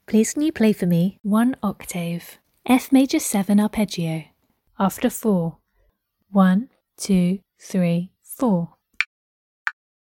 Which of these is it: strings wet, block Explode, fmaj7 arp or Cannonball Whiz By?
fmaj7 arp